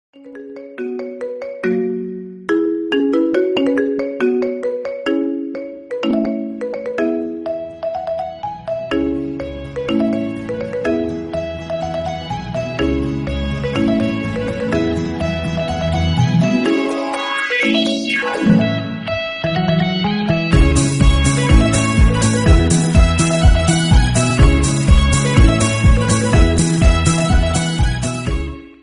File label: iphone marimba